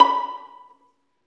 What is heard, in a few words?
STR Violin, Solo Violin FX; Roland L-CD702; Solo Vln FX